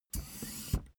office chair hydraulics down

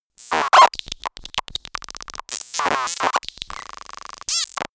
glitch communication

